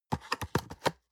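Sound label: box small close medium, Büroarbeit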